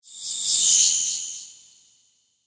Swell Shrill Reverb, Production, Element, Swell, Shrill, Reverb; BlastWave